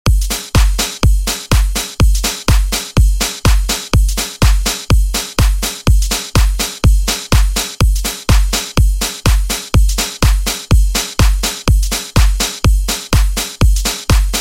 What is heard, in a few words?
Funk Redrum, 124 Bpm